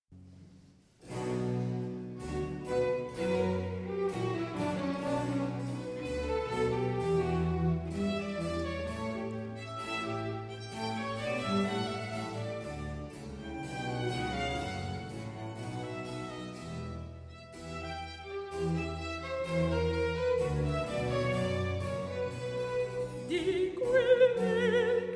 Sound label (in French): Mezzo Soprano, Voix de l'opéra; cartable musical